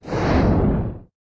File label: Fire wave; The Denpa Men; Android